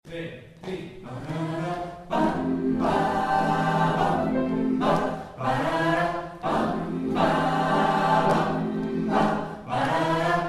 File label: jazz